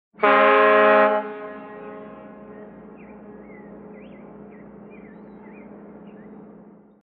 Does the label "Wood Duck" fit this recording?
No